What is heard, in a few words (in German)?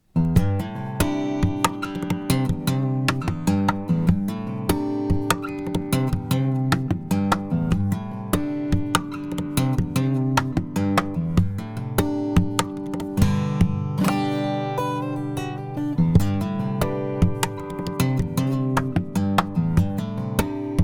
gitarre perkussiv; chandler limited rs124